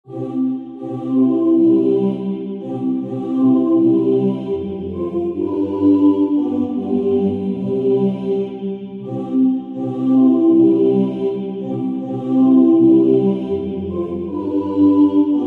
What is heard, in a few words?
tenor